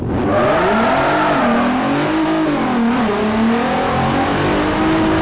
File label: first, vehicles; TDMCarpack; TDMCars